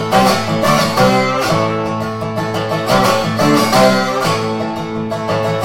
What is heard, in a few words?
dobro